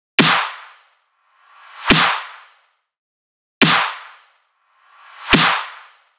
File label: Riddim Drum Loop 140 Snare Clap; Ghosthack; Riddim Essentials Volume 2; Full Drum Loops